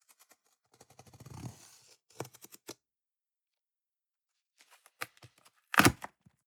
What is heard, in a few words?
cardboard box cut and open; objekte